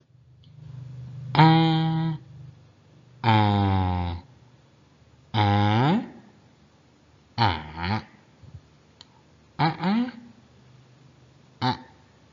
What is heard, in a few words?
Tones